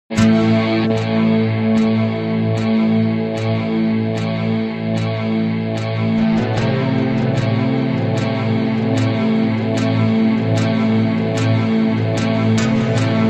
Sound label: Instrumental